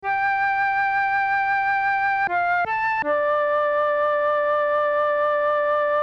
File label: flute